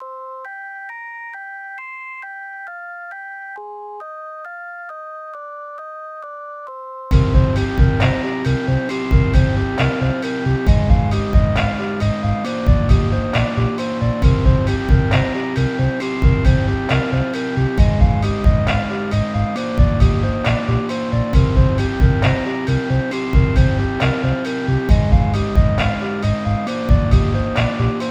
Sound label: sinewave